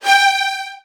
G3, Strings, VN 16TH; ILIO; Kirk Hunter

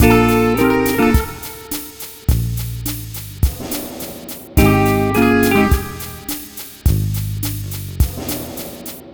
Multi Guitar Bossa Mix-A#, 105; Zero-G Kocktail Kollection; Construction Kitz (Lounge)

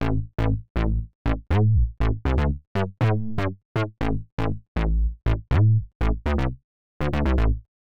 Synth Bass A; PowerFX - Nu Jazz House

